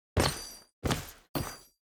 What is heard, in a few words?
Foley Step; Dax; Warframe; Cinematics; Duviri Quest; Courtyard